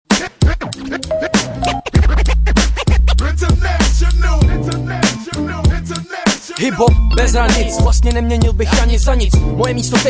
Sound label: intro rap refrain